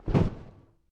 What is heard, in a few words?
body drop